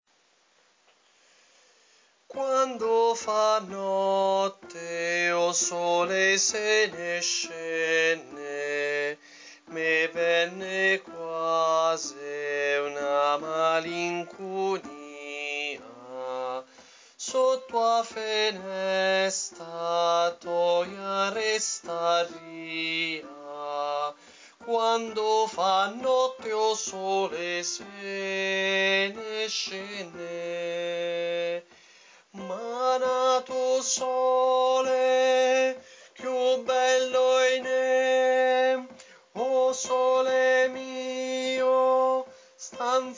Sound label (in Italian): tenori, Coro; Coro Isola del Giglio